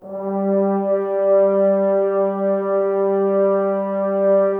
Brass F.Hrns; Sample CDs; Miroslav Vitous; Woodwind Brass Ensemble

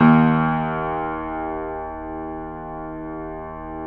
keyboards acoustic D#1; USB Soundscan vol.17; AKAI

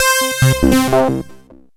DESCENDER, OLD SYNTHS; Rubber Chicken Software Sample Bank

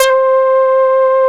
HS BA, synthesizer bass; Synthesizers; Soli-Music; World of Synthesizers VOL-1

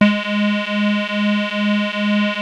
WASP; Masterbits; Vintage Voltage; SEQ-FX

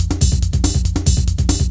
141 BPM, Drum Loops; Zero-G; Club Techno; Drum Set

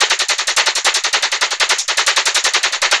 Vocobeat, Industrial; Sonic Foundry (Sony Creative Software)

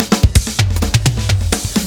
Fills 128BPM, Clubsounds

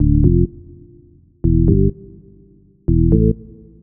Organ Bass (125BPM), retro house

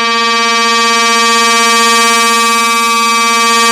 2600 G4, ARP 2600; Hollywood Edge - Giorgio Moroder Rare Synthesizer Collection

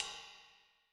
ride, Cymbal; Yamaha DTXpress-II

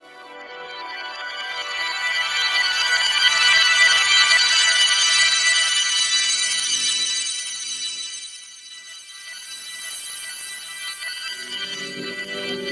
Star Sparkles, Ambient; Zero-G Seismic Frequencies; 90 s Sample CDs

